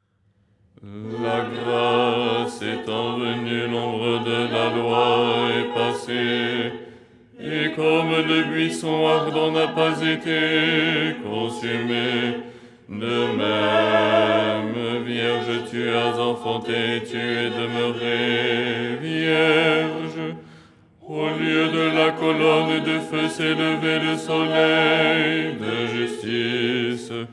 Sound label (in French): basse